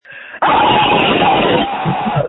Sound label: screams